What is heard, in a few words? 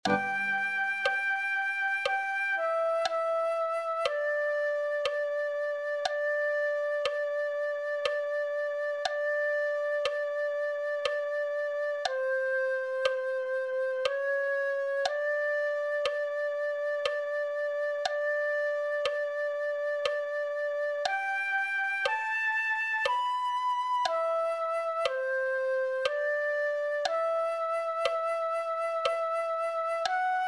flute 1